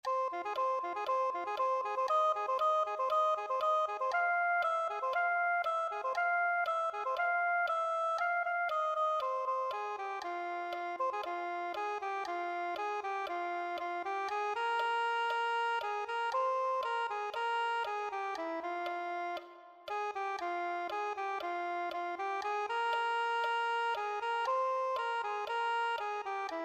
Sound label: soprano saxophone